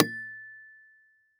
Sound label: Impact PVC Pipe Hit By Medium Metal Pipe; Impacts